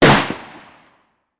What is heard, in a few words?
gunshot rifle exterior